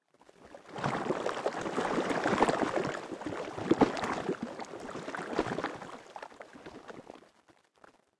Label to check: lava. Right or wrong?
Right